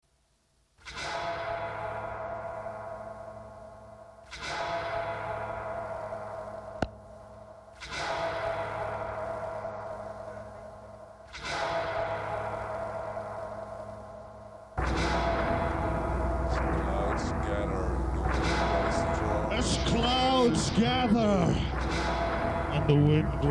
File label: noisy ii this is noise